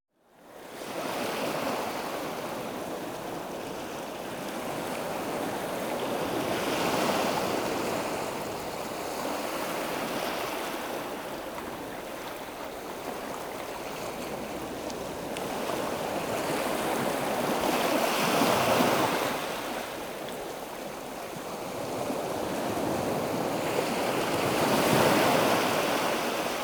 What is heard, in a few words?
Sea - Gentle Waves; The Elements